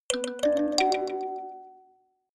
notification kalimba delay triad up minor high

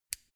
mini switch turn on hard

